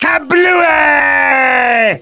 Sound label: blast; svencoop; cracklife